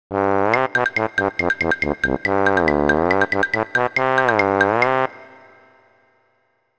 Trombone Tremor; Donkey Kong 64; n64

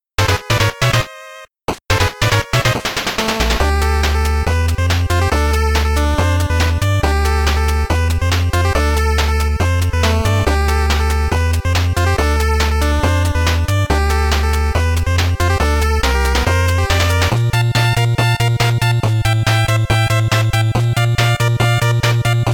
BGM INTRO 8bit; ExMode; Mighty No. 9; 8-bit soundtrack

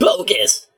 pain; surfer

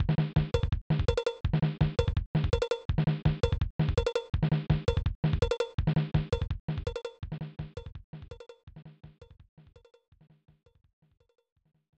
Drumbeat; 1994 snes; fun n games

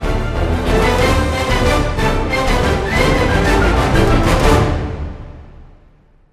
Stinger Celebration; Disney Infinity; Pirates

